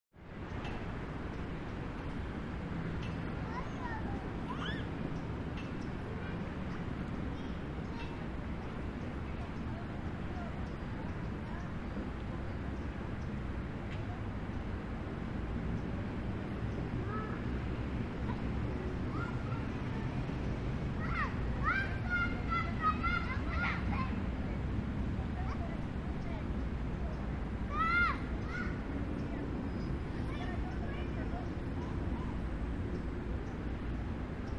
Park urban Distant, big city

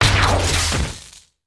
Low Pitch Lazer Shot; zaps

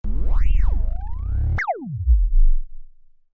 RAILGUN LASER; lasers

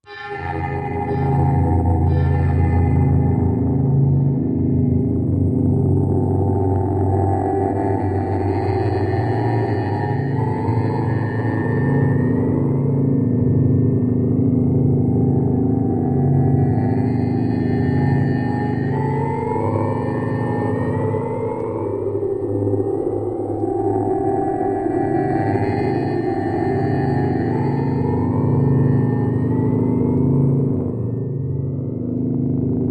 Empty Thought Amb Mid, eerie ambience

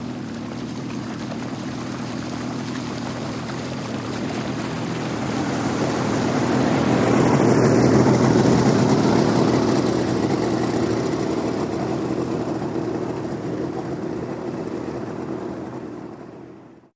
150 Hp Mercury Pass By

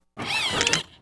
Boxing Robot Fall, servos

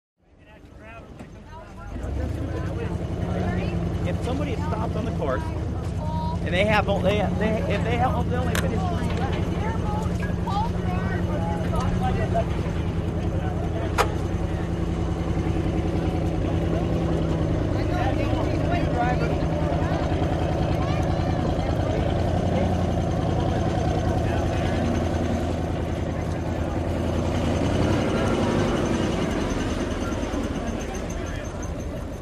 Boat Race Pit Doc Amb, motorboats

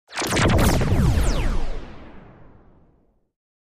Laser Triple Shot Tre

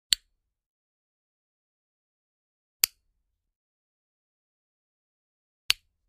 Lighter Cigarette Met; lighters & matches

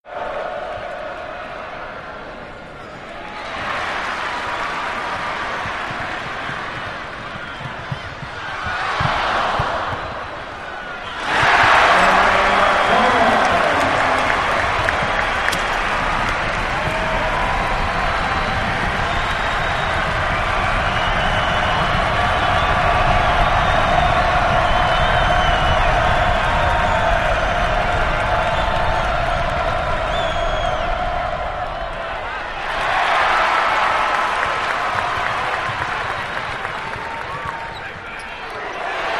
Basketball Game Pla, sports arena

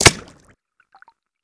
Bullet Impacts Water

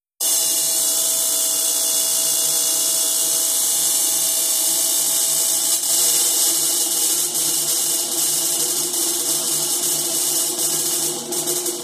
Tesla Coil Large; Slow Static Build Up / Loud Series Of Discharges, Medium Perspect

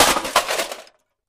Vcr Drop On Cement; electronics

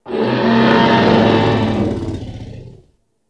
Dinosaur Roar Shorte

